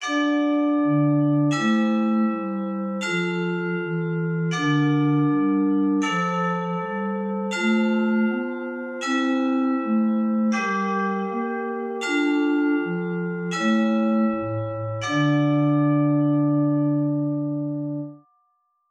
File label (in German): Zwei simmiger Contrapunkt; Ronge